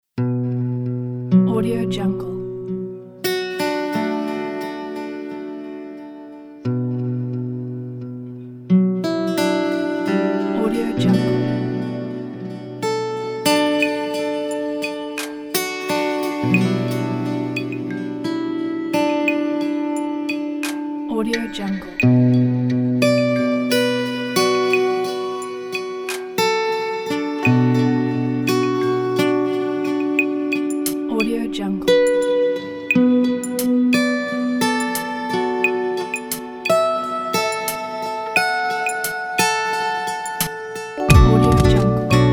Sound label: melancholy